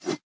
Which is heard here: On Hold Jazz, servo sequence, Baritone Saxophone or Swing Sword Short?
Swing Sword Short